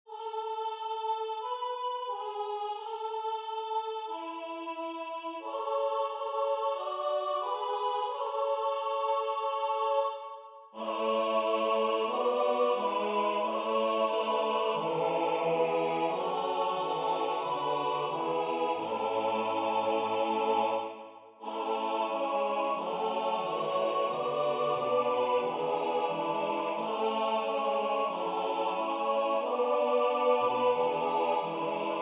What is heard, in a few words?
tutti; In 't nachtlijk duister